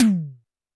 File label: TD-20 Tom A, Roland TD-20 Toms